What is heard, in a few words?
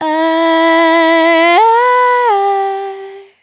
voice ahh ah ah; Loops; Phonky